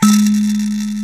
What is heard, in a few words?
SANZA 3 G#2; AKAI S-Series CD-ROM Sound Library VOL-5